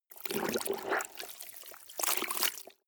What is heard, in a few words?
clothes water wring medium